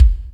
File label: POWER KICK, ACOUST KICKS; Greytsounds